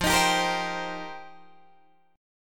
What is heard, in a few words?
F 7th Suspended 2nd Suspended 4th Guitar DADGAD, chord; F7sus2sus4; 15,18,17,15,15,17; strummed